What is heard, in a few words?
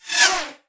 close